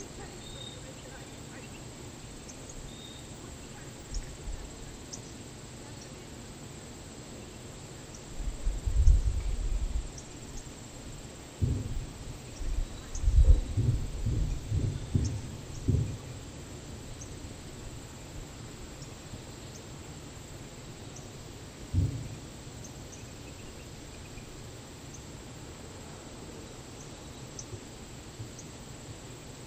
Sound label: Anomalous Environmental Sounds